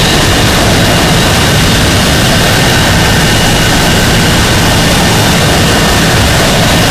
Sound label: jet rear, engines; War Thunder